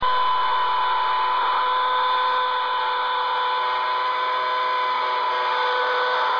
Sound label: wideband telemetry; cosmos353